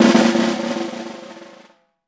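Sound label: snare decrescendo fortissimo; Best Service; Peter Siedlaczek's Advanced Orchestra; SN DECRSC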